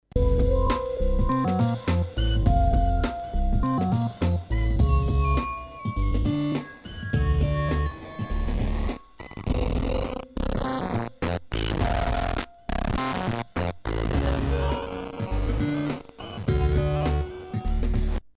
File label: Numark v7 crusher